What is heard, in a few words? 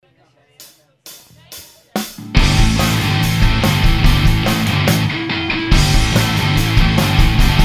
funky